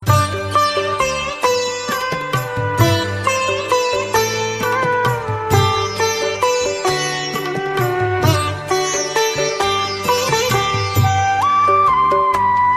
flute tabla sitar ringtone

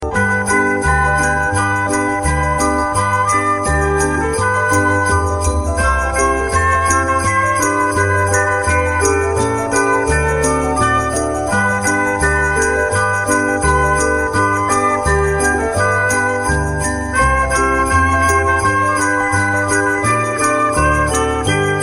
jingle bells ringtone

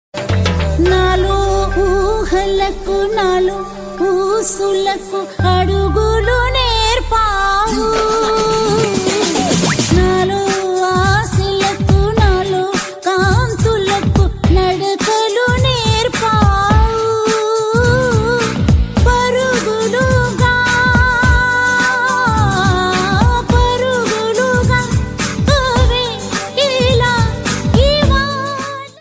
love telugu ringtone

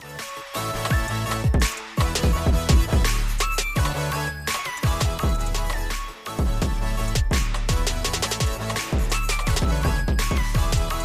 mix song ringtone; cool nokia

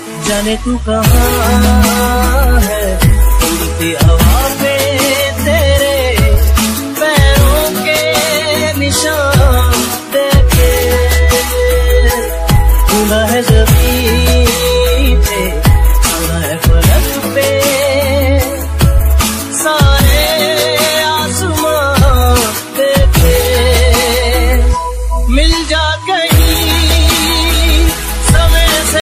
bollywood ringtone

